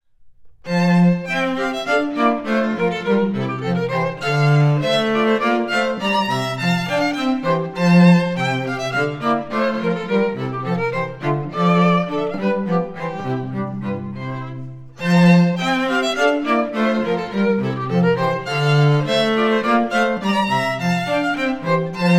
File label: hornpipe from water music; handel